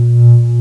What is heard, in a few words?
Alien Generator Hum; 1997 MMX LAN PACK (win 95); Army Men